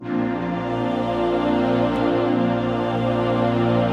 122 BPM Pads Amaj Gmin Tonic A, ambient synth pads; MMB